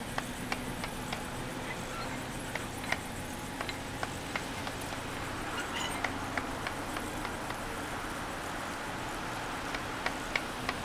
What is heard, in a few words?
hangar